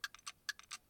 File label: clock ticking; firefight